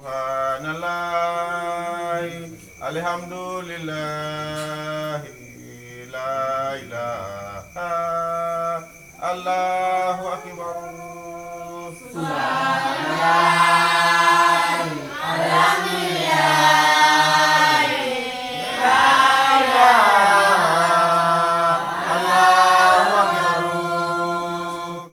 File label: africa chant benin; best service Blue Box